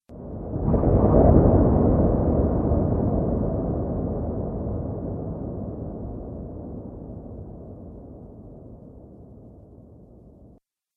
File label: Underwater Element, Water; nature